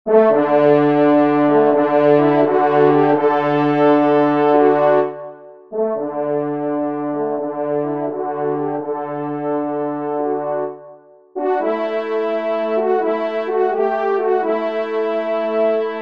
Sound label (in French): Valse des Chasseurs, trompe; 4e Trompe; P Bouillon; Auteur : Paul Bouillon